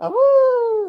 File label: awoo man; Voice Awo